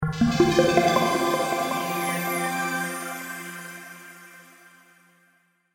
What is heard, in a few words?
Jingle Menu; Picross